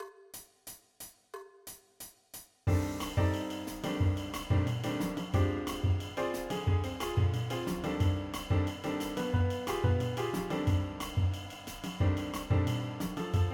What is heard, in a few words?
bass accompaniment